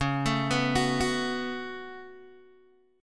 Db 7th Suspended 4th Sharp 5th Db down Guitar Open G